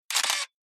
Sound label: Camera Film